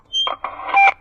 megaphone

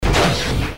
mech turn

